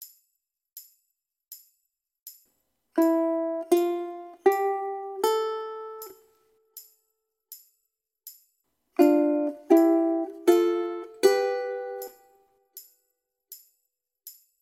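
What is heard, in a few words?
single notes pairs of notes, ukulele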